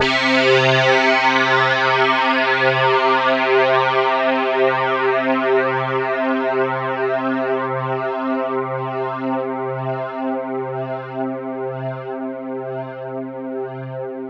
Brain Salad C4, solo synth; Giga Samples Collection

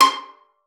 C6, VIOLIN PIZZ; Xsample VOL-5; 90 s Sample CDs

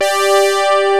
BRAZZ G4, Techno Trance; E-MU Formula 4000 Series Vol. 2

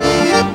ACCORD HITS; Best Service; World Colours

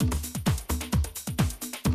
130, Classic DrumLoops

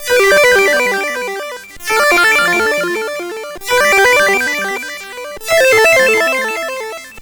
C64 Chord, 8-Bit chiptune

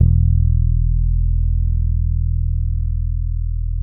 FRET E, PEDULLA BASS; Masterbits CLIMAX 7 - Bass

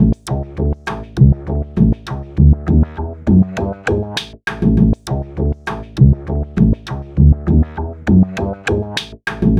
Remixed Acoustic Bass; Sonic Foundry (Sony Creative Software); Leo Cavallo Bass Taster

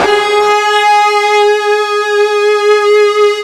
ULTRA FUZZ G#3, Guitar; East West; Steve Stevens Guitar Samples Collection

